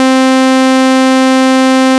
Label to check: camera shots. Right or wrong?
Wrong